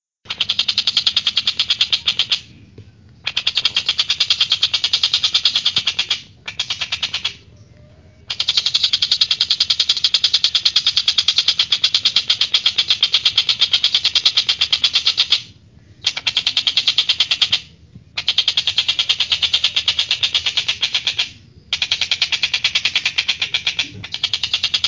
Suara Burung Tengkek Buto

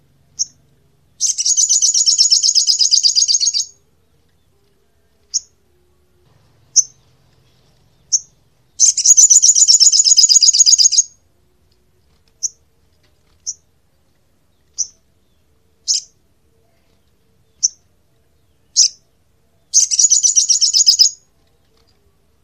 Suara Burung Sogok Ontong; untuk Pikat dan Masteran

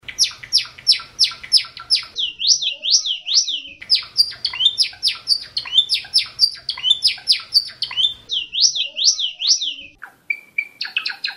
Suara Burung Gelatik Batu Gacor; Full Isian

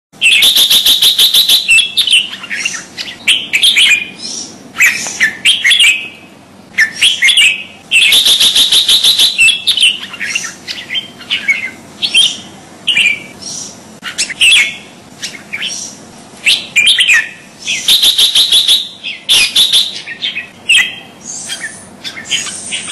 Suara Burung Kutilang; Full Isian

